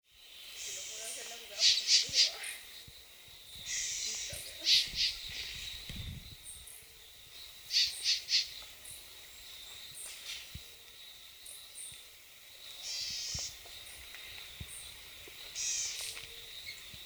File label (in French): Gobemoucheron a face blanche Polioptila albiloris